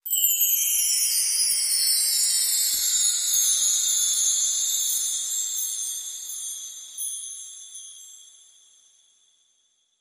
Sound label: Fairy Dust